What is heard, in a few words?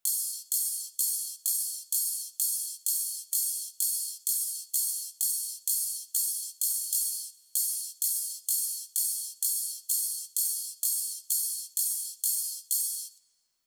Drum Loop 128BPM Ride; VEC5; Drumloops; Multi Loops